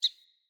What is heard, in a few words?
Sparrow Single Chirp; Animals; Vocalizations